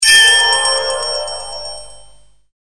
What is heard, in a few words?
Shorty Message Ringtone